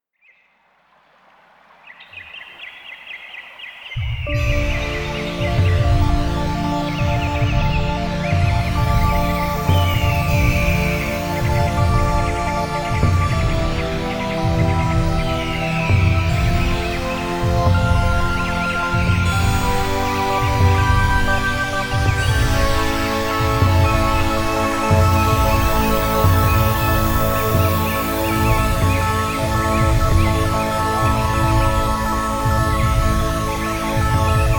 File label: instrumental